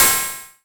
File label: DX hat open; E-MU Formula 4000 Series Vol. 2; OrbitPercMaster